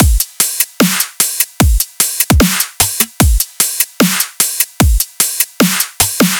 Dubstep Full Drum Loop - 150 BPM; Drums; Loops - Full

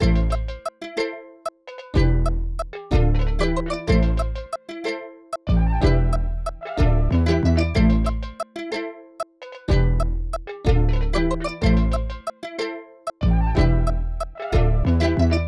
124 BPM A Min, Melodies; Cymatics; GHOST (Beta Pack); Arsenic

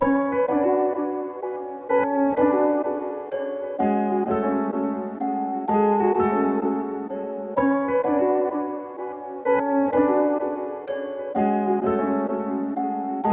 127 BPM F# Min, Melodies Dark; Benzo; Cymatics; ZODIAC Beta Pack

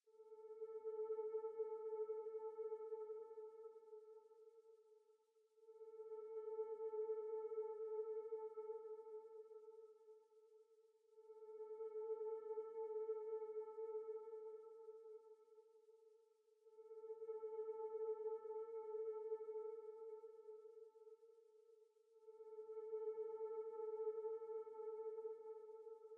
87 BPM D Min Lead, Afrobeats; Melody; Loop Stems; Waterfall; Cymatics

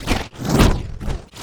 wooshes transform sweetener

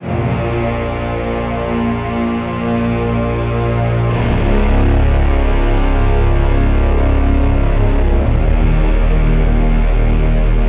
Basses - Bbminor 60BPM; STEMS; Ghosthack; SkyRealm; Mood Kits; Mood Kit 07 - All Is Not Lost

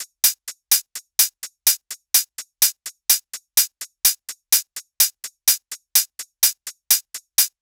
Future Rave Kit 126bpm Hihats; Drums; EDM Drums; Drum Kits; Stems; Ghosthack; Ultimate EDM Drums; Drum Mega Bundle; Future Rave Kit 07

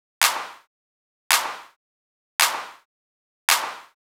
Clap Loop - 110Bpm; House; Ghosthack Advent Calendar; House Kit; House Construction Kit

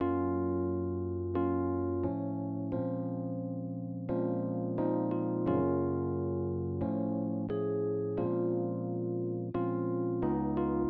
Classic Melody Loop 88 BPM A# Min Rhodes; Loop Stems; Cymatics; Oracle Sample Pack

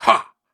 Grunts and Chants, Viking Vocal

